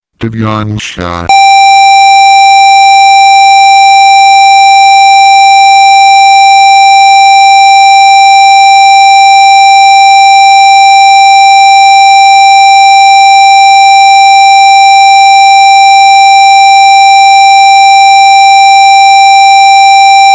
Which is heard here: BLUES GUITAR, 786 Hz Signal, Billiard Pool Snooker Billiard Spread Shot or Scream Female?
786 Hz Signal